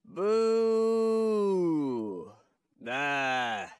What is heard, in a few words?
Man Booing; Zomboid; Lifestyle